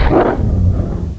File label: jump; openffa